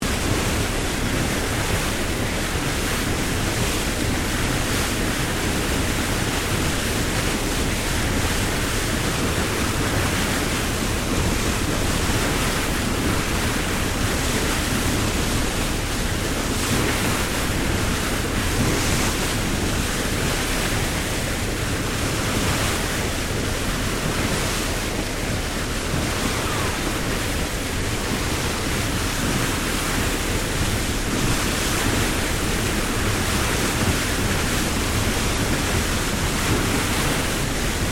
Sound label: Boat Ferry Freshwater Class Sydney Bow Wake Stereo; Manly Ferry; Boats (Sound Effects:Vehicles)